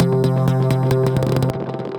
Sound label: Bit Strum, Synth Rhythmic; Ableton; Glitch and Wash